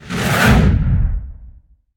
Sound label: invisible, stalker creature